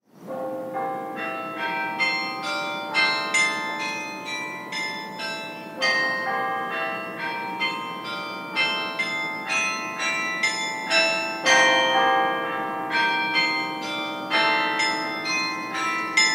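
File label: The Hour of Dreaming, carillon arrangement, arpeggios and a delicate, woven melodic line; Range: 3 Octaves; Publisher: GCNA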